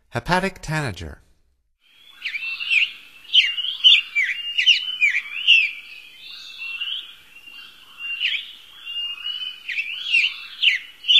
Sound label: Hepatic Tanager, Songs of Birds of North America; Stokes